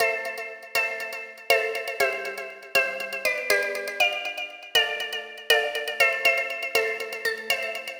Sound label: steel drum; ultimate vital bundle; rocket powered sound